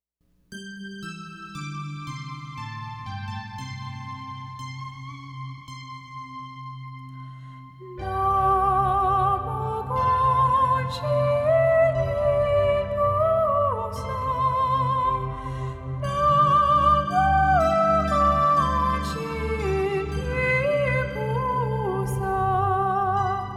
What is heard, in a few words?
soprano